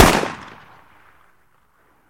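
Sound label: revolver shot with receding echo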